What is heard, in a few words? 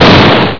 crash nonfatal, cars; ultimatestunts